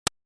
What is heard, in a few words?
snare